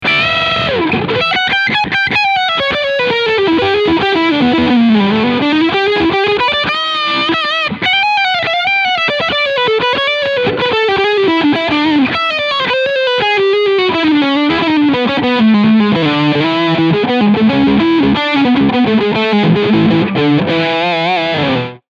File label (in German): Heritage Guitar H 150 OSB E Gitarre Lead Sound Steg Pickup